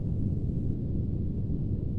room; Mafie 1

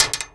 metal; Mafie 1

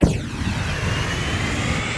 jet on; Mafie 1

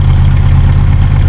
airflow; Mafie 1

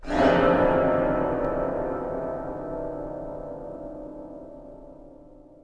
attack; Mafie 1 cz

